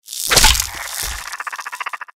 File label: mirelurk attack; npc